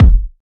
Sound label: boomy kick drum f key, Royality free kick drum tuned to the F note. Loudest frequency: 146Hz; kick drum samples